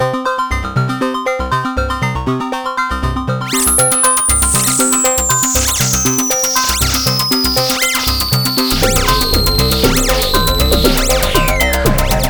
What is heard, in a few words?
MicroBrute